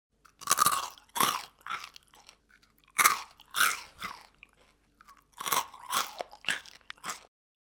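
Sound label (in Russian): хруст чипсов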